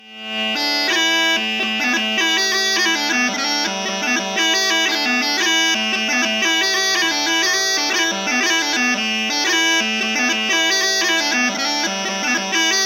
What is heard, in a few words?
The Caffeine Junky, Jig, bagpipes; My Brother likes his coffee strong!; Big John Bagpipes